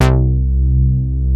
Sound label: C3, BASS; Q Up Arts; Dream Experience; BASS BANK 12